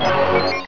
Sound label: health